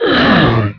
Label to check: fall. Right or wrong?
Right